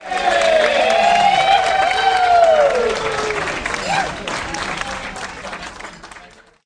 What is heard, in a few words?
cheering